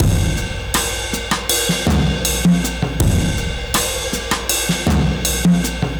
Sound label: Drums Alt 80, 80bpm; Loops n Lines